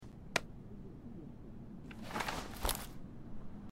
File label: take out sketchbook draw; Life is Strange 2; gamesoundopedia